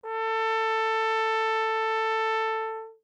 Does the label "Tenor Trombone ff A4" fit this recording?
Yes